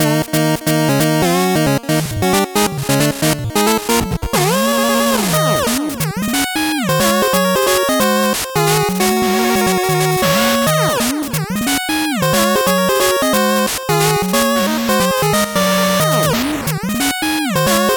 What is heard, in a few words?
8bit bgm slow